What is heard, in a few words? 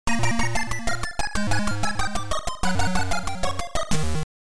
Title AY Beeper; Chase HQ